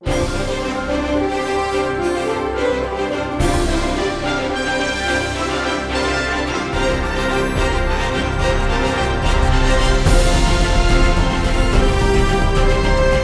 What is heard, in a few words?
Crowning Victory (No Choir), trailer music